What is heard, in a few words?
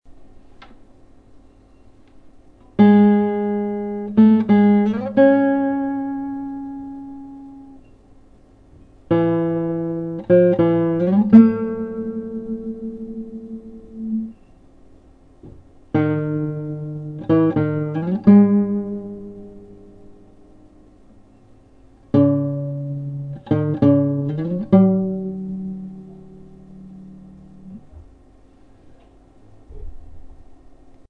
brass sound, gut string